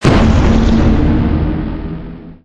Siege Tower Fire; destructibles

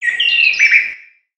Peaceful Bird One shot

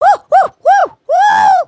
double wave, emotes

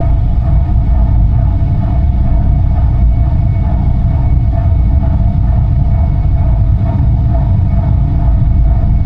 Engine Glow, ambience; nexus tower

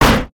Lid Close; Dragon In A Box; destructibles

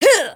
Male Grunt; dialog

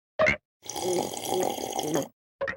Drink, emotes

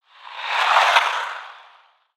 Ice Oneshot; Spinjitsu

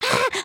freak out, emotes

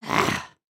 indignant, emotes

